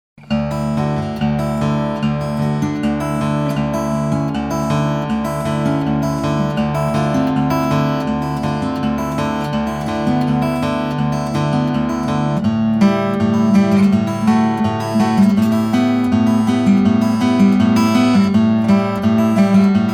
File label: Guitar